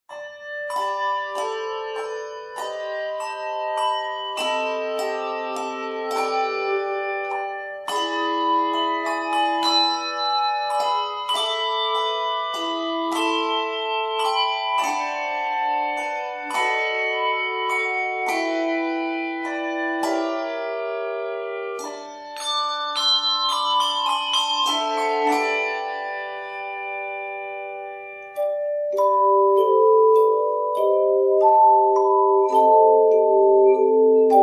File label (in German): nocturne g dur, handglocken